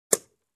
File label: Sewing Tool Box cover close